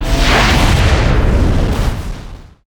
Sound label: Light Strike Array